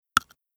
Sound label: scale